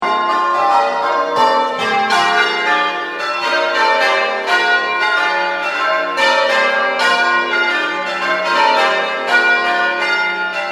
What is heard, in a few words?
Bells